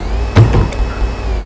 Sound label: water